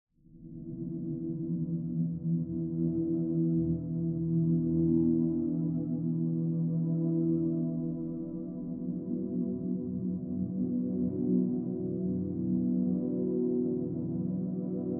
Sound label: Reverb